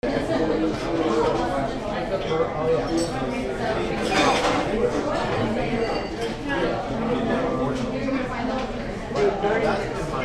california crowd; red robin

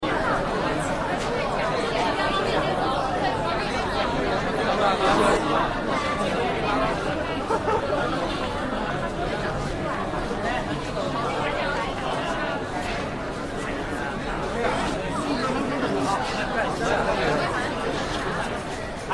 atmo airport chinese crowd

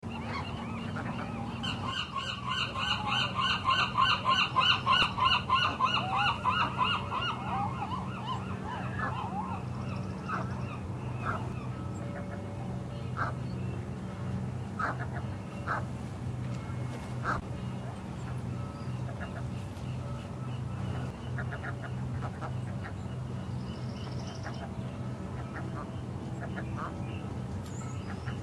atmo harbor close and distant gulls

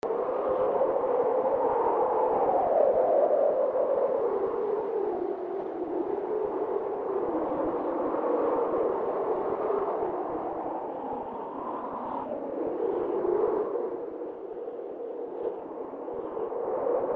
atmo dark desolate wind

